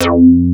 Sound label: Synth Bass; Vengeance Samples; VEE Synth Shots